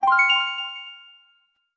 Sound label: Text Highlight; Highlighted text appears in a text box; Skyward Sword; zelda